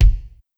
00s short reverb kick sample g key, bass drum tuned to the G note. Loudest frequency: 173Hz